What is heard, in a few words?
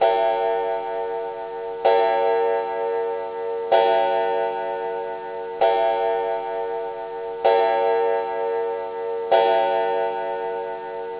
clock